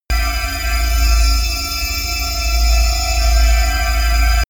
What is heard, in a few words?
promo pad; music elements